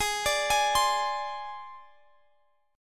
Ab Major Flat 5th Ab, Guitar Half Step Down; arpeggiated; AbMb5 Chord

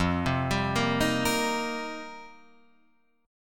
F 7th Suspended 2nd Suspended 4th F Guitar Open F; arpeggiated; chord; F7sus2sus4; 5,7,7,5,6,5; JGuitar

